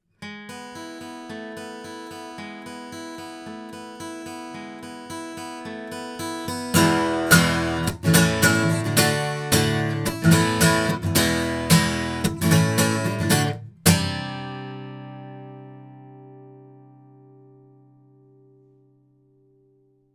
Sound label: MXL 603S guitar